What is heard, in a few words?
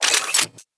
Weapon Reload (Laser)